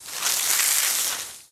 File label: Water Hose Spraying A Tree, Soft Start